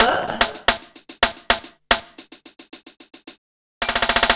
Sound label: 110 Hats, Drum Loops 110bpm; Aural Aphex; Nu Dance Electro